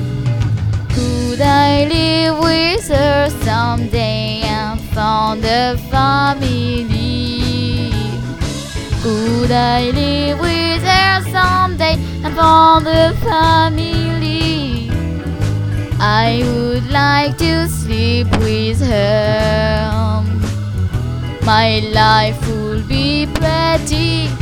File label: blues